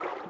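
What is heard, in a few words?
water run right, footsteps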